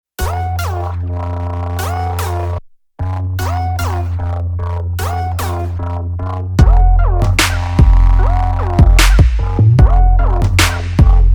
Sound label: Instrumental